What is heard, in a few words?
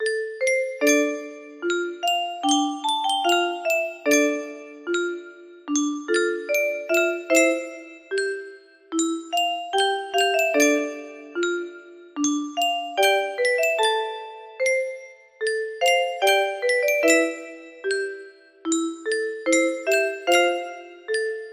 music box version